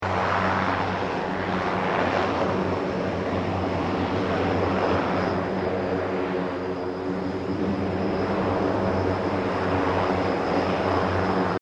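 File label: C130 Hercules pass, Lockheed C130 Hurcules, Airplane; Airplanes (Sound Effects:Vehicles)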